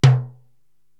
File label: Timbale Lo Open, Korg TR-Rack